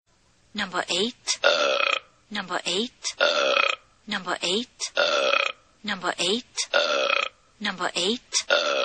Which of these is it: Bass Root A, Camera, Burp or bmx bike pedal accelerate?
Burp